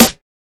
old school short reverb steel snare drum sample a sharp key